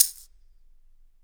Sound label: SHAKER STEEL; Big Fish Audio; Ross Garfield Drums VOL-2; GM PERCUSSN